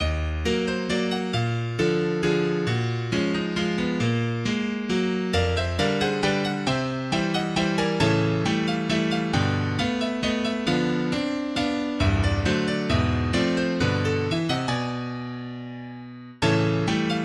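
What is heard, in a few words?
Waltz, MIDI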